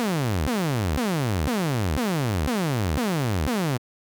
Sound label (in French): LFO Dent de scie descendante pitch 12 st rate quarter 120bpm